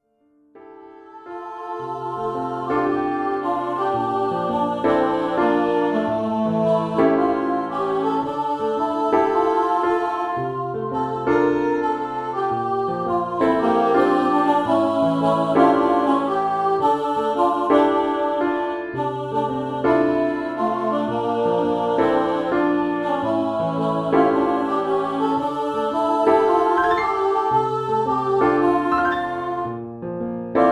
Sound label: vocal duet + PA